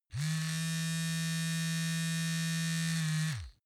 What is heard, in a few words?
razor smooth